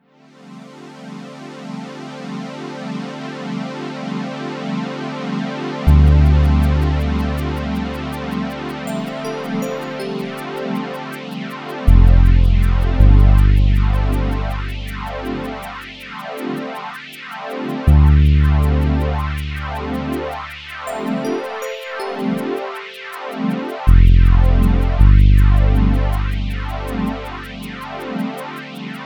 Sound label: PAD mix